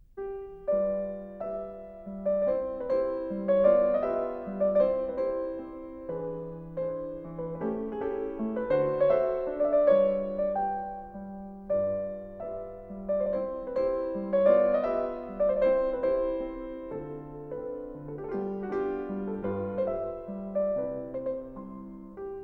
Mazurka in C, Piano

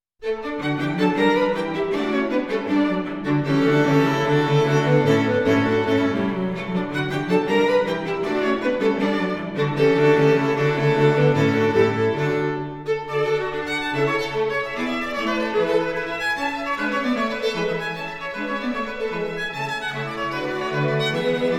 String Quartet in D, Molto allegro; Mozart; String Quartet No.2; K155

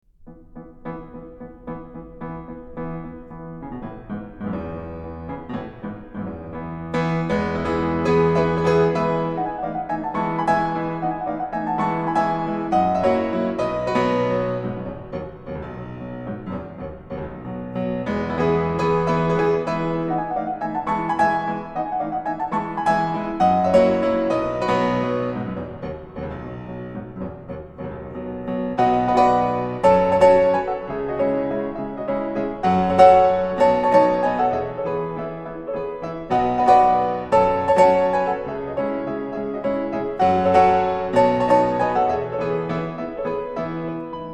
Mazurka in E; Chopin; Mazurkas

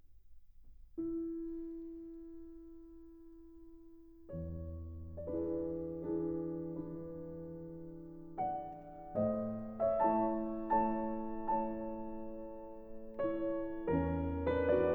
Prelude op.28 no.7 in A major; Chopin; Preludes

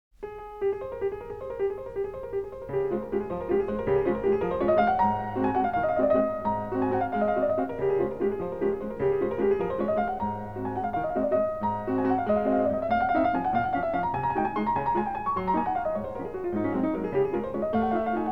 Waltz in D-flat Major, Op. 64, No. 1, Piano; Chopin

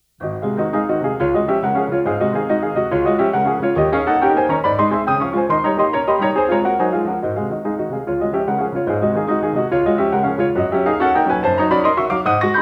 Etudes, op.25 - No.8 in D flat major, Piano; Chopin; Claudio Arrau

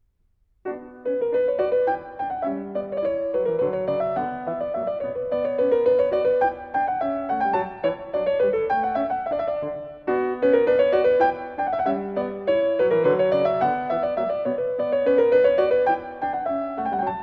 Anglaise; Bach French Suites

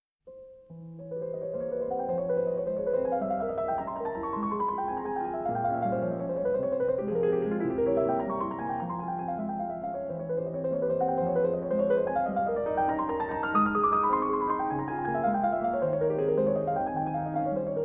Op. 25 No. 2 in F Minor, Piano

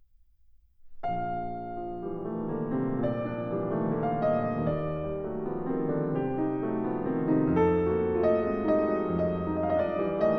Prelude op.28 no.21 in B flat major, Piano; Chopin; Preludes

